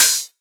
Open HH, Cymbals

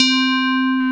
FM Bells; 90s Sample CDs; Club-50 - Foundations Roland; FM Syns

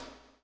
COPS; Spectrasonics - Backbeat

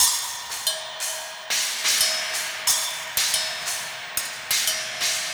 Cymbol Particle; Particles

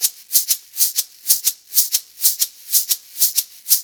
Blues Perc Loop; Drum Tools; Sonic Foundry (Sony Creative Software); Loops & Samples Drum Tools

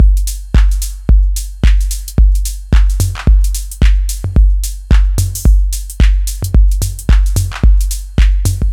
110 808 drum loops; M8; Electronisounds

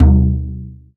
Darvul; Planet Earth; Digital Sound Factory Planet Earth

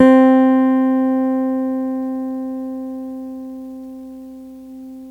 CHAPSOLO, CHAPMANSTICK; Greytsounds

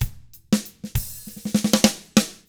95 funk, drums; ILIO; Double Platinum Drums 1

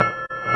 VIBE; CMP - Analogon

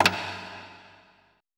E LOG SLP, ETH DRUMS

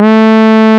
SOFT BRS, JP6 Brass; E-MU Producer Series Vol. 2 – More Studio Essentials

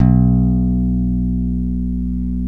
ALEMBIC BASS; OMI - Universe of Sounds

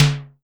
SIMMONS SD-5 drums; SDS5

